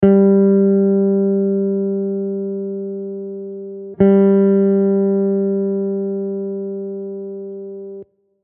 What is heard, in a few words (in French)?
corde